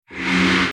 wand autoattack cast

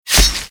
impact blade

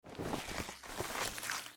gather

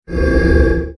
mystic missile effect

